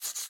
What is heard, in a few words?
Sketch Env Write; Maze